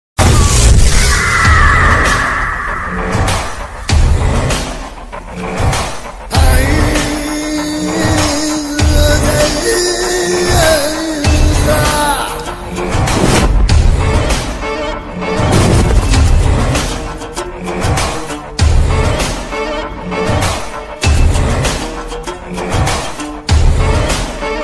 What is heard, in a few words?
villain mass entry bgm ringtone telugu bgm ringtones; vinaya vidheya rama